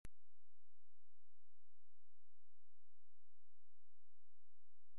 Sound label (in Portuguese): BATIDAO